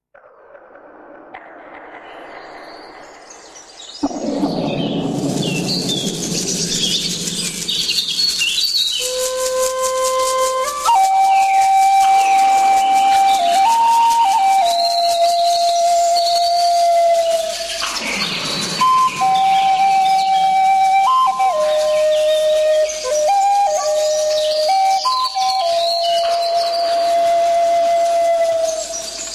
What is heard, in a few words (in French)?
natural musique tambour chamanique